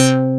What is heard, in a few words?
Bass, SYN; Best Service Gigapack 2